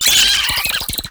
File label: Brood Spider Vocal; Warframe; Oraxia